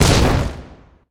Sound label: balls destroyed; 祖玛